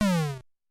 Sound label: sound fail